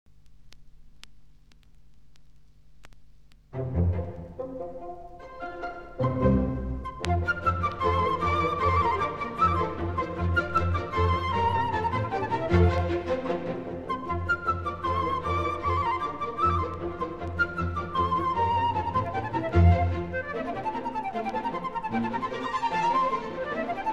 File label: Tambourin; Классическая музыка